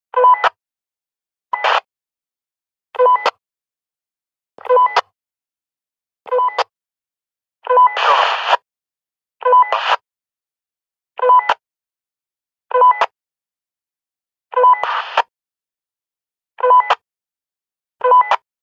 Walkie Talkie, Cobra MicroTalk, Radio Communication, End, Noise Squelch, Beep, Series; Handheld Tranceivers; Sergey Eybog